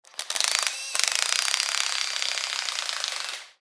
Mini Impact Wrench, Mini Impact Wrench Turn On Run Turn Off 9.6 Volt; Mini Impact Wrench Turn On Run Turn Off Long 9.6 Volt; Power Tool; Industry